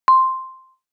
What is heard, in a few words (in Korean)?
정수기 온수 75도